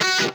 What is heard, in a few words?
Guitar Chop; Curse Crackers For Whom the Belle Toils